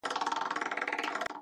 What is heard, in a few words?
slot